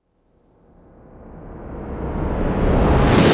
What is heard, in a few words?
cinematic reverse